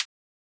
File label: Hihat Closed Mid Noise